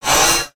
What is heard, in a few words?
Spinjitsu Charged